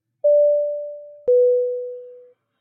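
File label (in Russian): звук ремня безопасности в самолете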